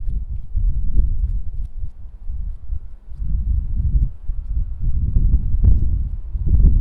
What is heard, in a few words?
Foot Step Again; fieldrecordings